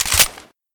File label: p228 slide, weapons; kuilu